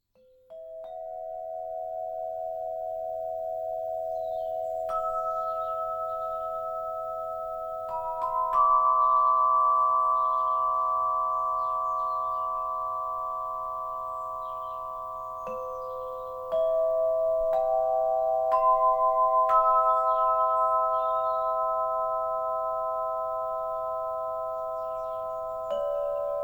TubaloP, tubalophone; tubalophone feu

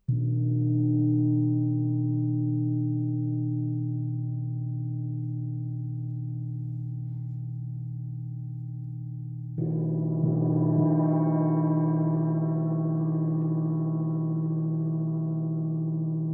Gong Modele; gong modele 3

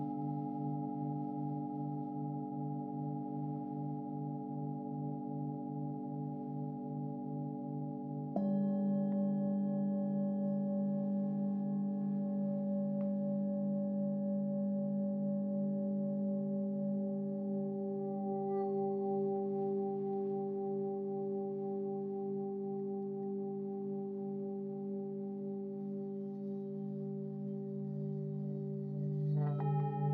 Bain sonore Bols cuivre

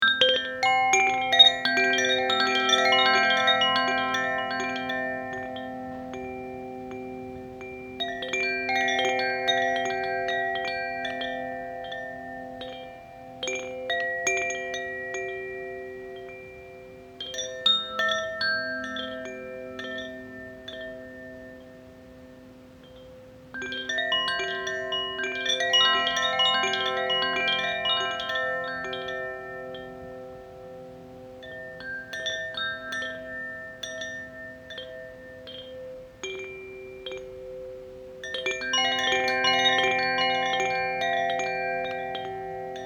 Carillon; Terra

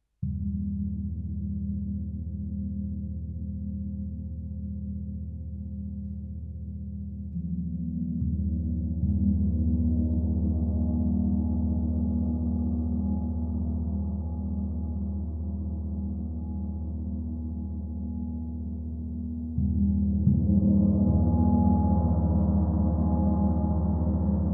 Gong Modele; gong modele 1

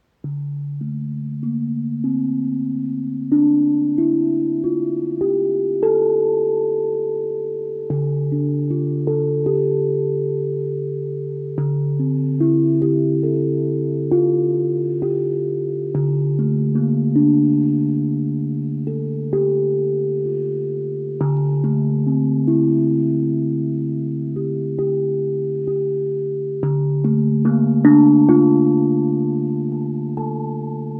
re mineur 9 tongue drum

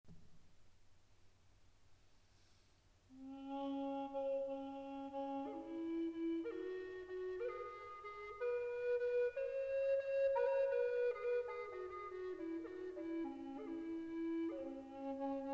flute double do diese 432 pentatonique mineur, double flute amerindienne

